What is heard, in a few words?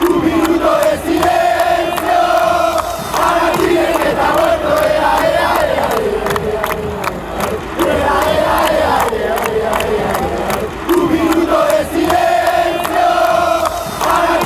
silence river chant